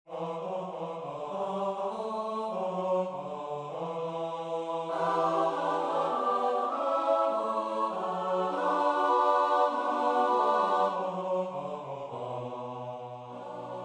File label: midi; nowowiejski; msza polska bogu rodzica gloria